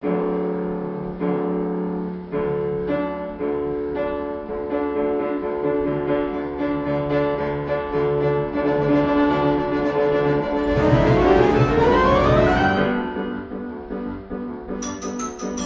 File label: hupfeld model; HUPFELD MODEL 1 PAN ORCHESTRA